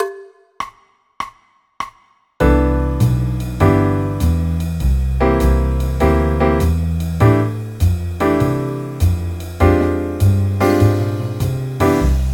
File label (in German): c dur akkordverbindungen